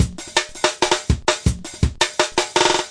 164 bpm tight and roll it out, drum loops and breaks